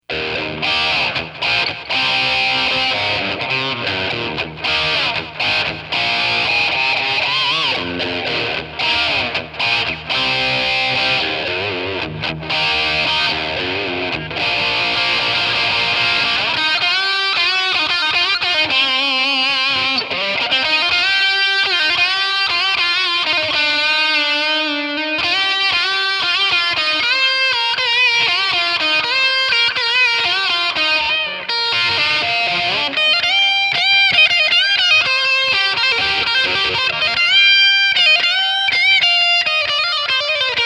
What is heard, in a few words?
strat test